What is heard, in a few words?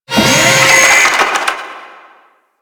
Shipyards Robot Malfunction; Ambience; Props; Warframe; Grineer Shipyards